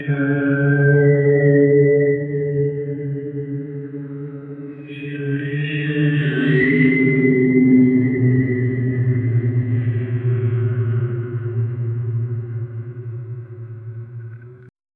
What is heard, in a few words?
a dark and haunting merma, shadowy